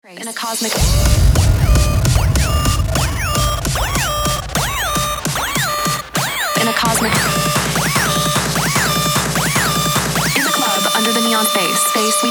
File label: Drums Bass with dance